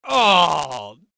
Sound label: Ooooh Reactions Male; People